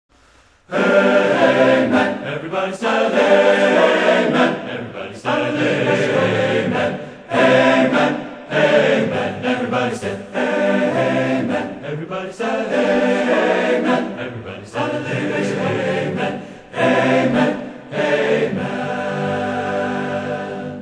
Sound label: chorale